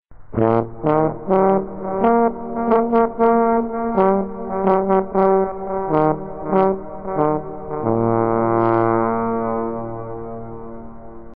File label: trumpet; vapesquared